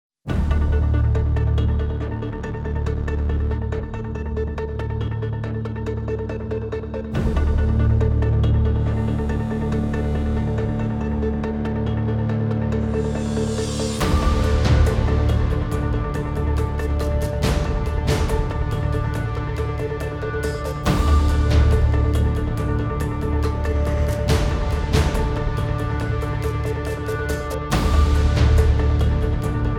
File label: Perc and Pulses; Brand X Music